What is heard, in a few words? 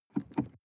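sports car interior pedal gas step and release; autos; verkehr transport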